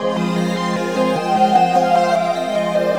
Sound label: SYNTH D+B 160 C, Drum+Bass, Keys; eJay